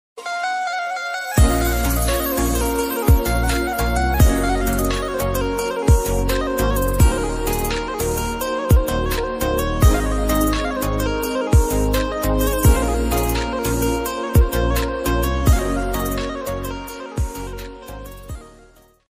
song ringtone gujarati ringtone gujarati instrumental ringtone